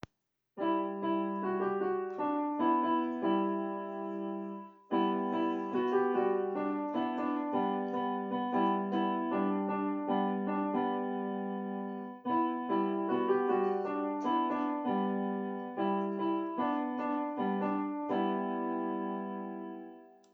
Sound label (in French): Un merci à chanter (refrain instrumental)